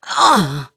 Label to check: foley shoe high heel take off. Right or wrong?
Wrong